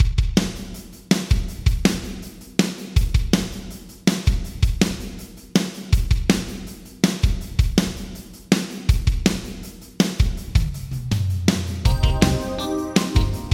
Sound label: Drums Yamaha ProR3 Wide Hall 1.2s; Vintage Digital